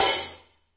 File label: HiHat; A Real Freakin Drum Kit; REAL LIVE KITS